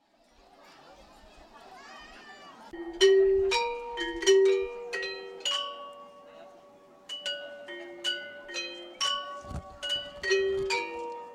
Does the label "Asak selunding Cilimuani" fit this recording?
Yes